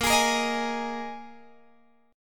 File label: A sharp 7th Suspended 4th A sharp, Guitar Open G